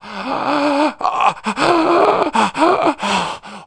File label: WELDER AFTER FIRE; Messiah